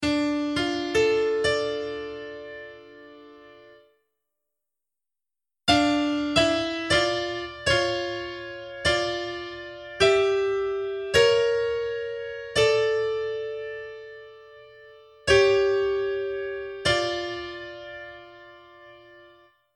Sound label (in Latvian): intervalu; INTERVĀLU SECĪBA